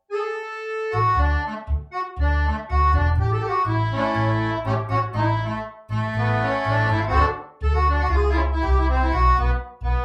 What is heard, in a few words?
Tango ed Gar Rev